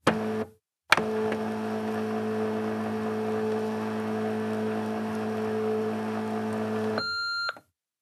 machine office, ambient; nuke

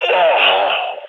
ct death; vo; gign

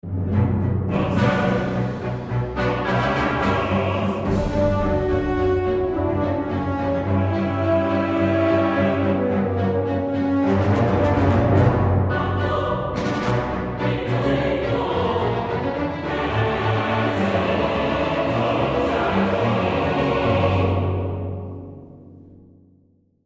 start round, music

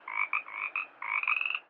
frog; animal

